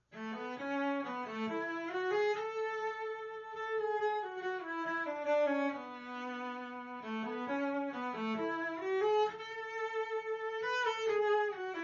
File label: Gavotte, cello; Aylesford